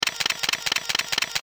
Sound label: Multi Shutter Sound; LG GS390 Prime